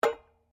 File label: SLEDGEHAMMER METAL IMPACT LIGHT